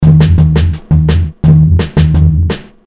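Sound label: 85bpm hip hop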